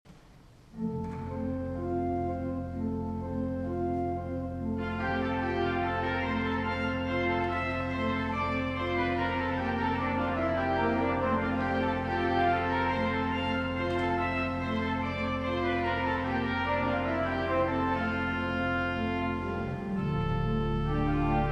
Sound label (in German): Versette F-Dur; Lefebure Wely